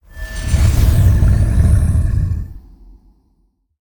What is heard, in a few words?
Boss Gorilla main, monster